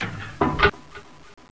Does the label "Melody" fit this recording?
No